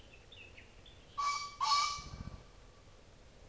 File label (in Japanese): kiji